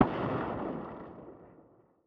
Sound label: fire far distant; Rust